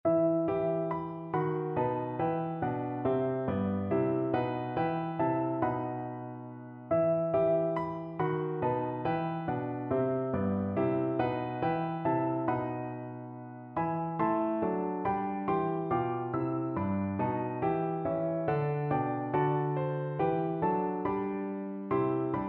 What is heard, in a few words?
god rest, piano duet